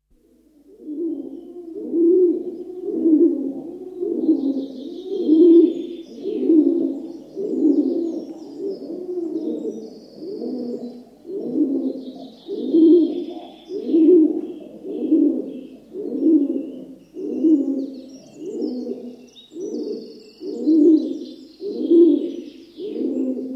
Rock Dove, coos and calls from several in old barn, wing flaps, chaffinch, jackdaws, wren and other birds in distance; Bird Sound Effects; BBC